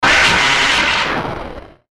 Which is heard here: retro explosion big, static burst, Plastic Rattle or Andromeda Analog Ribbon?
static burst